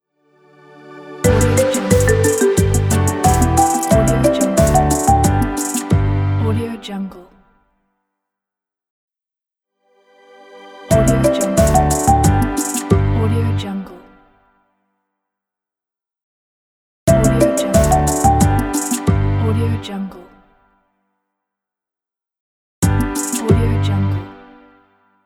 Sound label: happy intro logo; redcatmusic